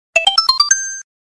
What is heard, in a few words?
1up; Yoshi